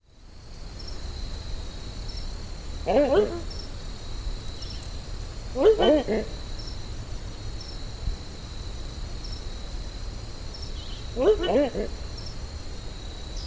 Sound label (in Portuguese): canto da anhuma